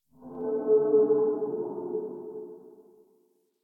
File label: Exterior Distant Calls Scared, Ambience